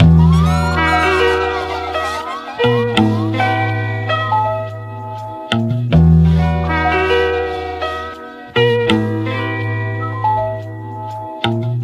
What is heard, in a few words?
bass, Loops